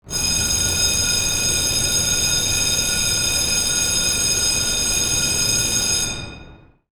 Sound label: alarm ringing